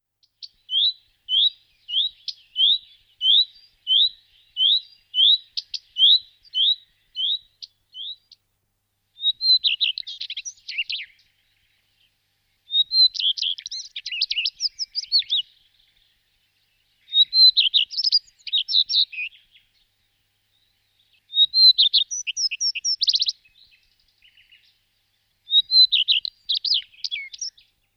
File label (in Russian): Golosa ptic Obyknovennaya gorihvostka Phoenicurus phoenicurus